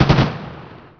camouflage apc gun; Emperor - Battle for Dune

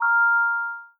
short beep; Hearts of Iron IV Death or Dishonor

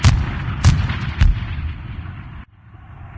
city battle, streetwar; ambient, levels

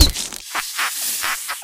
bugbait impact; weapons; Garry's Mod; hl2

